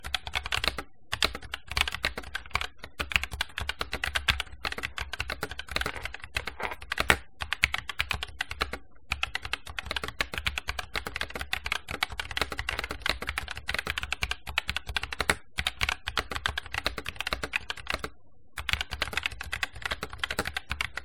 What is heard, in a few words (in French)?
enregistrement son asus rog strix scope rx tkl wireless deluxe